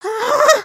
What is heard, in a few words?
fear; emotes